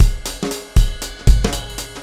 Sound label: Shuffle Beat